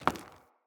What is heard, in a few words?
Mockery Foley Movement; Enemy; Mockeries; Characters